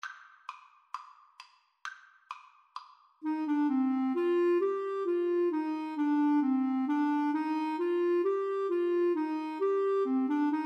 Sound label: bourree, Flute-Clarinet Duet; bach